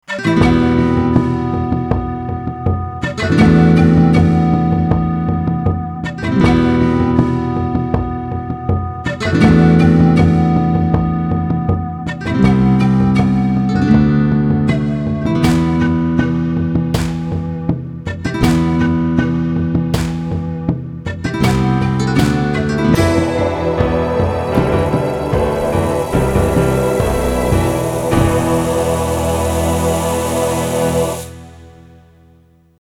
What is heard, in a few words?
Pipes